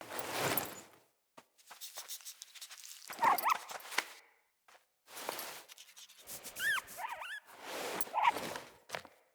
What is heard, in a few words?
Interact Pet Hare; Foley